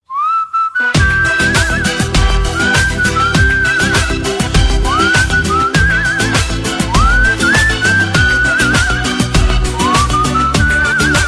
Persian Whistle

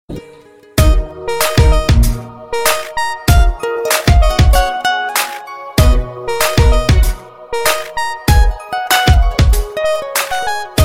Hip-hop

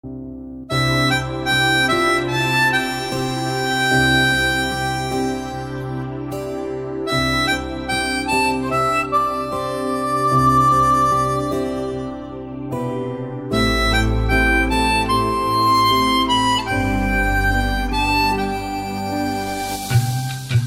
Mouth Organ

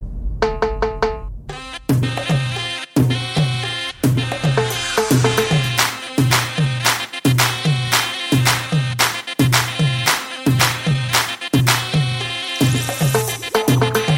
Cocktail Tone

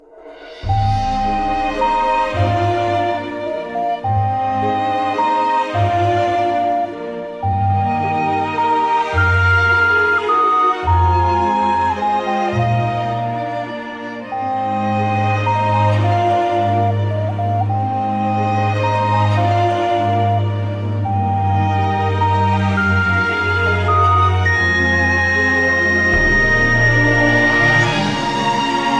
Lullaby; Zeldas

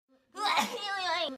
Sneeze; Twice Momo

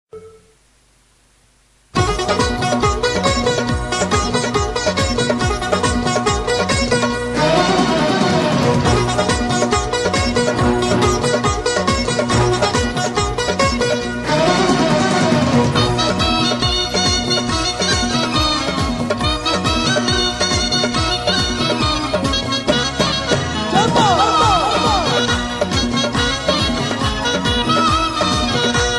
Oriya Tune

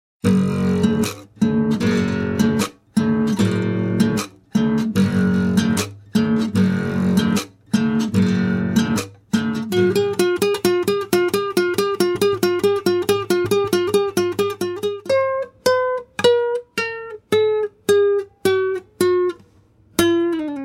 Nylon campfire

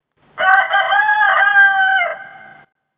Cockerel Crowing

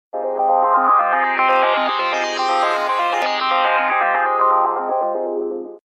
Stella Ringer

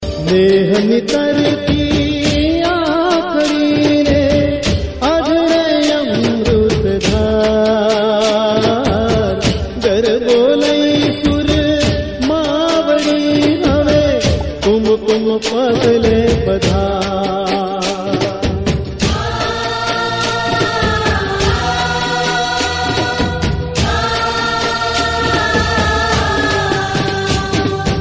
Gujarati Tone

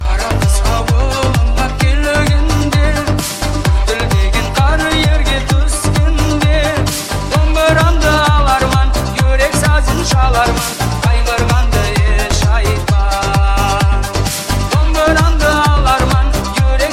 Dombra Remix

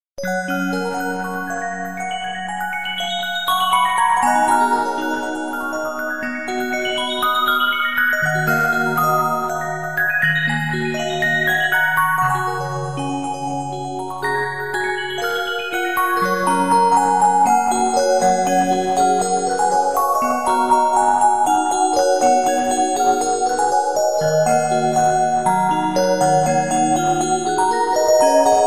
Music Box

